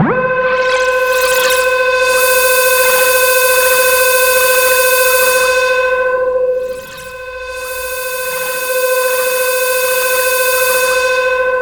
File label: C5, Synth Line; 90 s Sample CDs; NiceBeats; SynthLine VOL-16